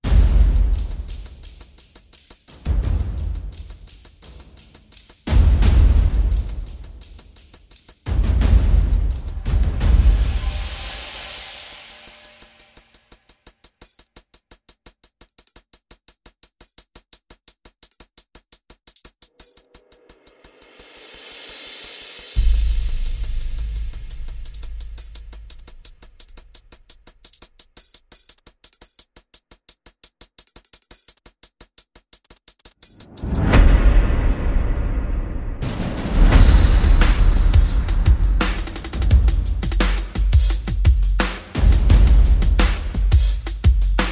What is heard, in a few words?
Perc and FX Only